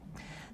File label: Birds